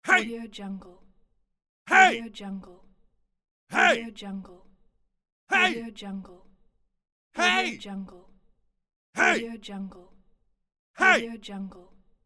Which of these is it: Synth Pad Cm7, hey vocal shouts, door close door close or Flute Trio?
hey vocal shouts